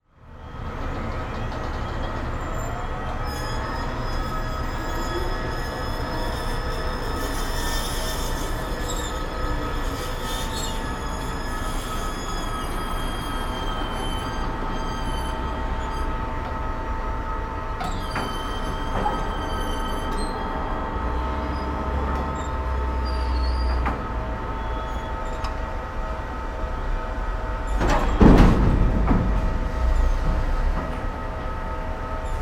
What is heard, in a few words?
container port